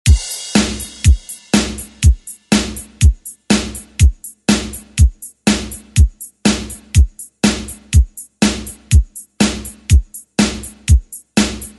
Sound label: ReDrum